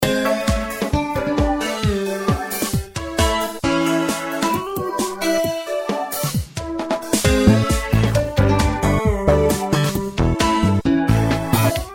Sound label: instrumental